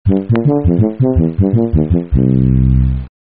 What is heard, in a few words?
trombone